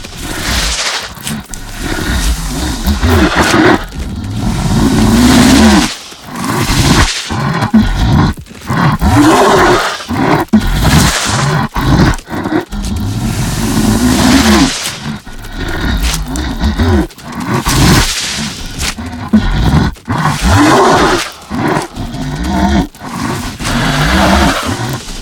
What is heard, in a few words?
Chase High; Devour; The Inn; Zara